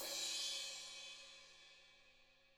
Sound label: ZILDP CRASH; ILIO; Double Platinum Drums 1